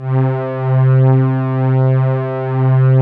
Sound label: SYN LUSH, Piano Layer, Pad